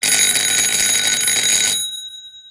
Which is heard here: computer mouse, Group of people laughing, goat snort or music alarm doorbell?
music alarm doorbell